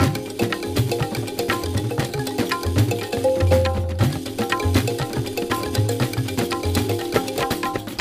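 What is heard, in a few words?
music world music action part a percussion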